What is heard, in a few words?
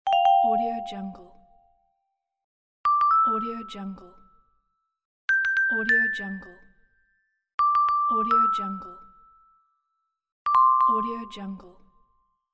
vibraphone massage